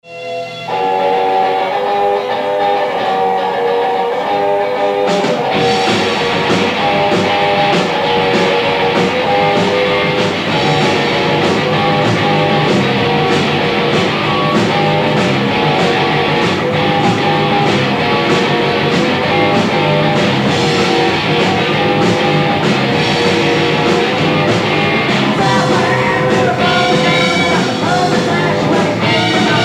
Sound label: felt hammer